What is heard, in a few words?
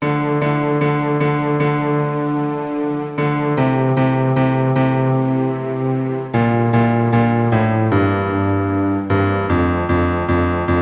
bass